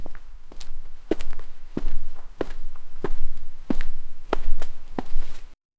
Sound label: quiet footsteps; stealthy